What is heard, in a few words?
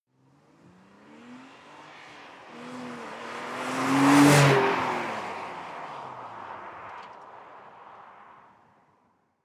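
sports car exterior passing by fast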